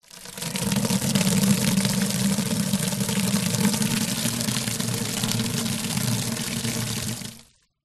nature water pour onto base of upturned plastic bucket